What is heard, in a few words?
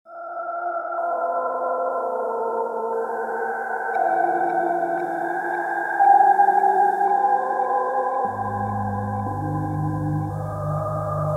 rippling water